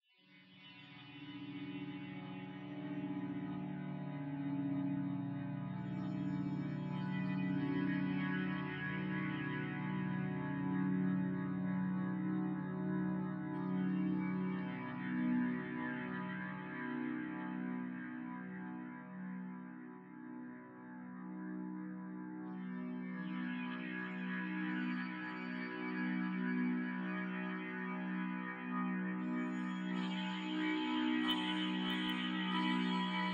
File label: Guitar